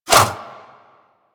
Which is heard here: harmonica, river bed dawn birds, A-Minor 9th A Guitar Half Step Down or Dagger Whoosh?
Dagger Whoosh